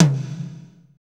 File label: tom, Power Ballad